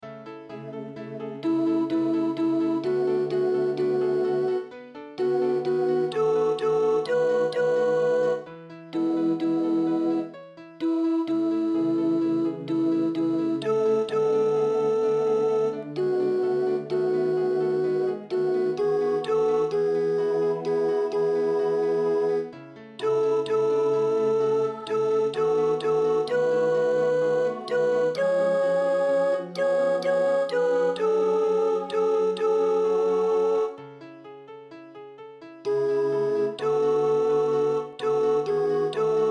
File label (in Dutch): Met aarde toegedekt sopraan